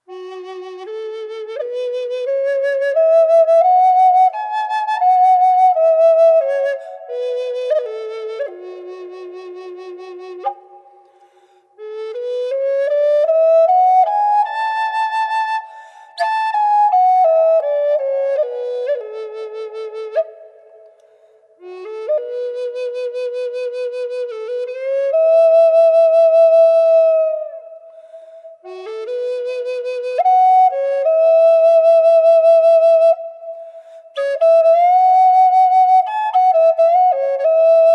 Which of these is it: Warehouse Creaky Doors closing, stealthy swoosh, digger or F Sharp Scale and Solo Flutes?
F Sharp Scale and Solo Flutes